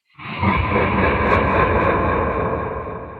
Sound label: Laugh; Necromancy; Ares